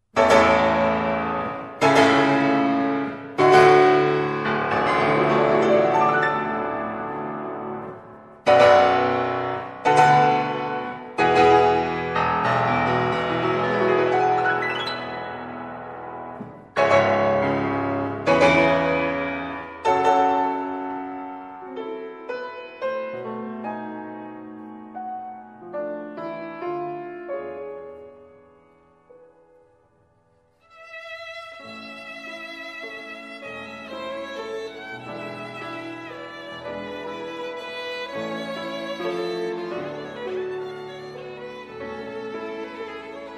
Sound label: viol; paganini; i palpiti; violin